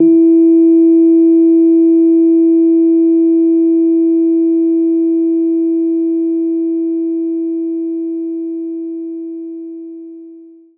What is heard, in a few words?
Rhodes E3; knights try